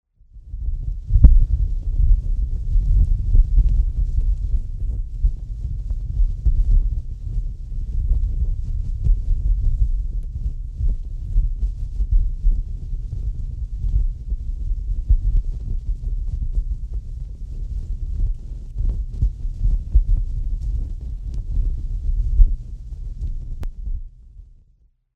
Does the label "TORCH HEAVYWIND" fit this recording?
Yes